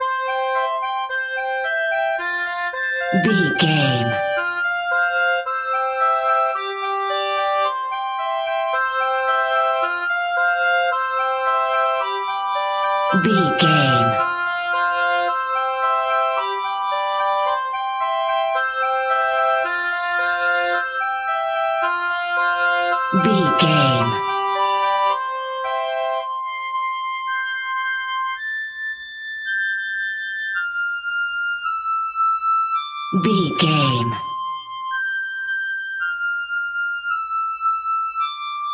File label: accordian, Squeeze Box; Twinkle Twinkle Little Star Squeeze Box